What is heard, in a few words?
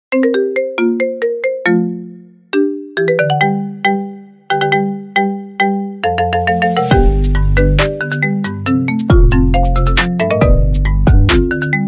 Marimba; Pokemon Red Blue